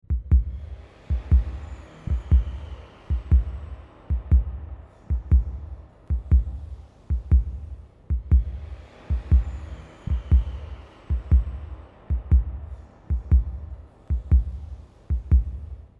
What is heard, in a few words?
heartbeat